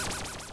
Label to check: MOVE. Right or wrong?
Right